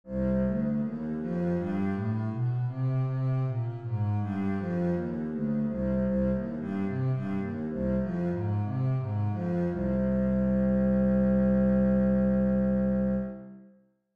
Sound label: bass C minor